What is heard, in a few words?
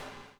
BUBBLE; Spectrasonics - Backbeat